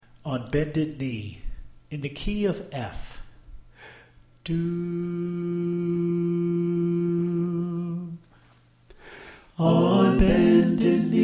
Bass Left

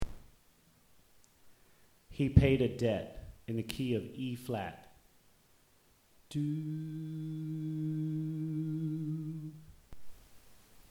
Soprano Left

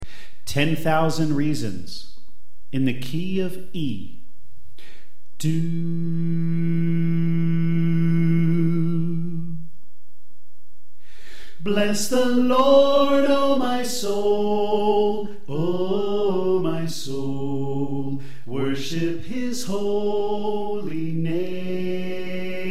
Bass Left

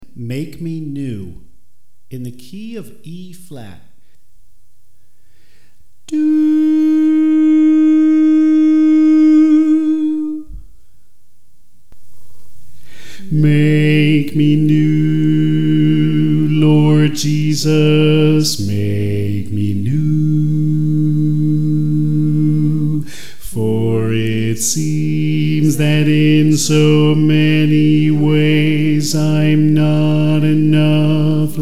Bass

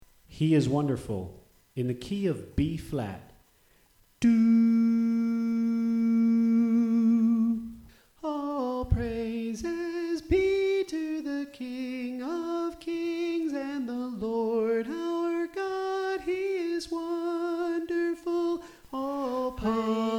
Tenor Left

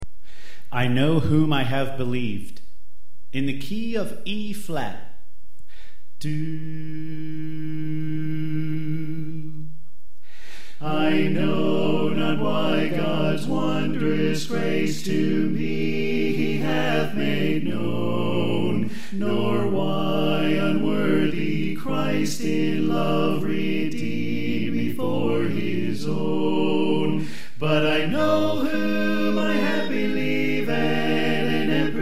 Soprano Left; I Know Whom I Have Believed

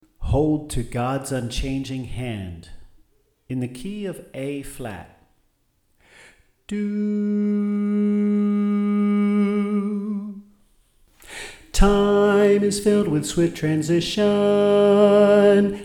Soprano

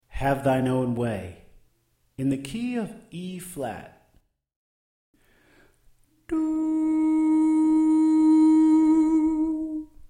Tenor Left

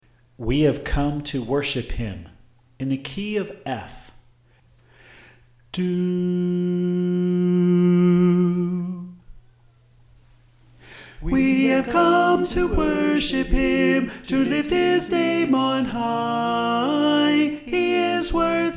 Alto

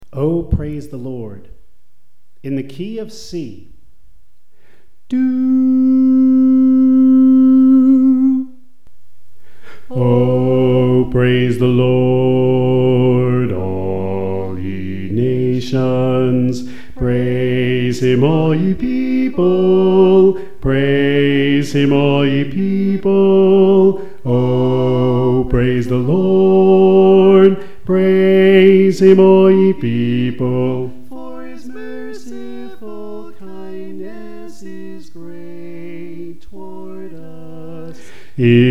Bass

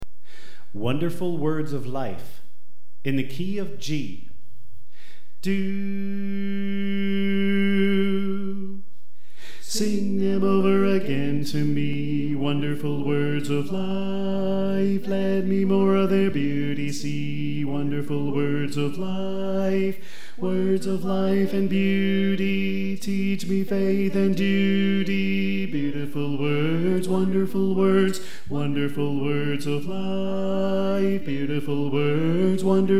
Bass

